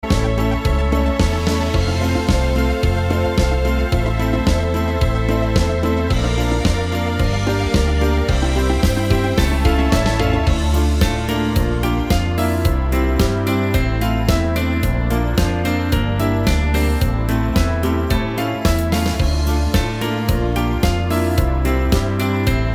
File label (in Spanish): cumbia